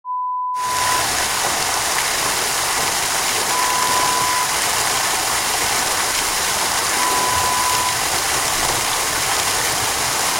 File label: Fountain sound